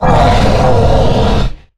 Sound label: Harpooned; Evolve; Goliath